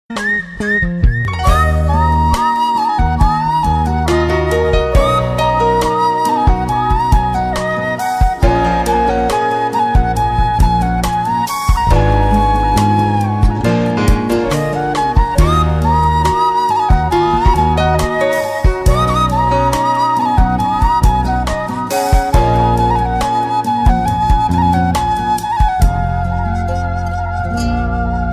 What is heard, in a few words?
sweetest flute ever